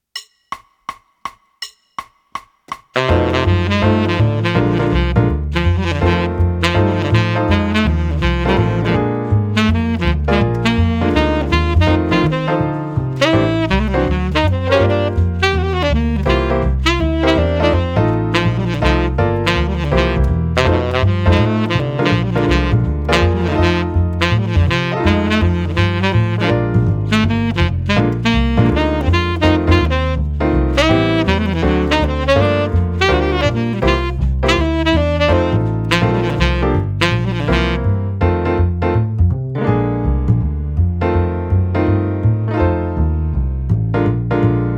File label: Drums